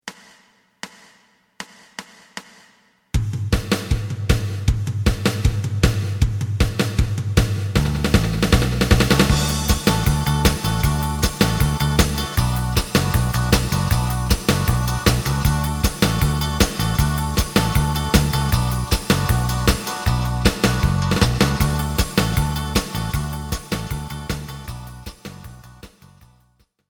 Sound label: lead